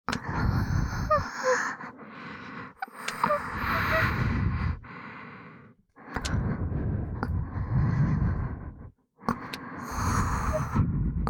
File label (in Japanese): 耳元 囁き 喘ぎ; (両耳); 白耳; パンパン系効果音版